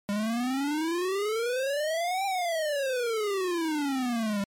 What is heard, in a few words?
NES General Sweep